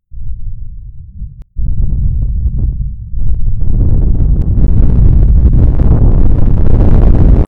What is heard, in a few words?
Bomb